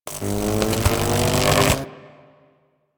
Lightning Strike Charge; Enemies; Abilities; Warframe; Teralyst; Rainalyst

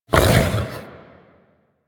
Melee Grunt E; Enemies; Vocals; Warframe; The New War; Archon; Amar

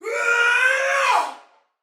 Battle Cry, Female, Soldier; Dialog; Barks; Sinecure

